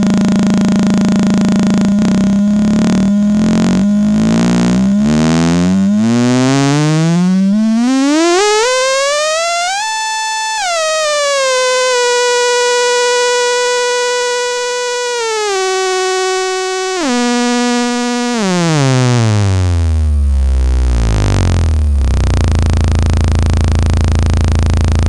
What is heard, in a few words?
SID mod sync triangle